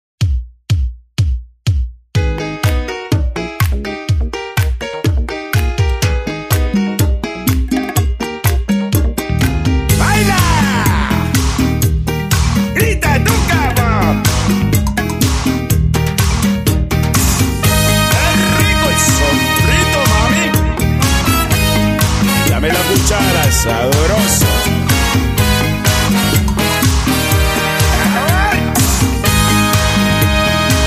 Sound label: cha